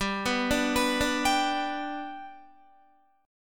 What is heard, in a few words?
G Major G down Guitar 4F 4A 5C 5G 5C 6E; G arpeggiated; JGuitar